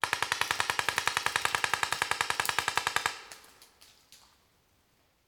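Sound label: Paintball Gun Impact; Sound Ideas; Guns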